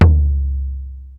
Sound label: Drum Tracks; Best Service Gigapack 2